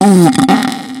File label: farts